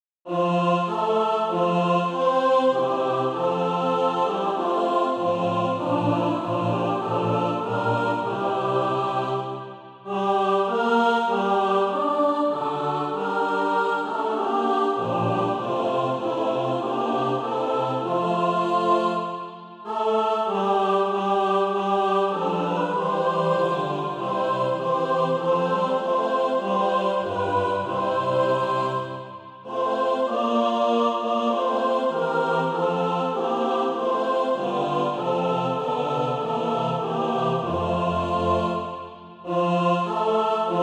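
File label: mixed, Practise Music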